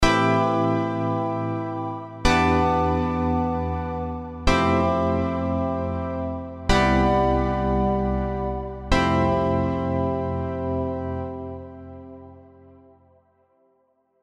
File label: unstable pulsed organ, yamaha cs 80 analog synthesizer